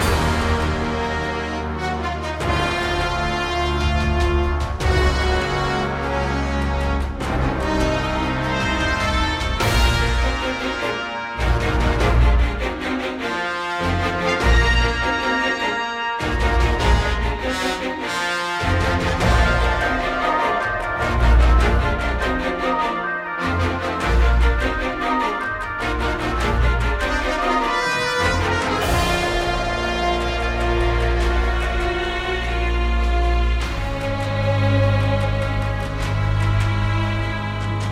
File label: bat loop; chernobog; 明日方舟